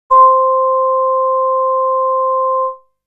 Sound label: Solar Flute C